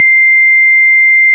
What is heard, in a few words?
ORG Farfisa; Roland LCDP09 Keys of the 60s and 70s 1; Farfisa Clar